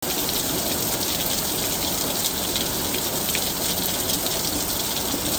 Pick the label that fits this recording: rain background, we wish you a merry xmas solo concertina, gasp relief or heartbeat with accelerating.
rain background